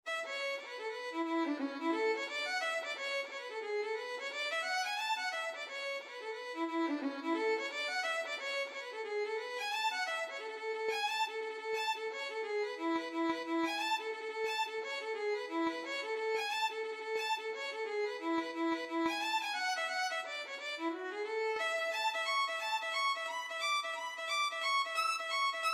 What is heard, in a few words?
violin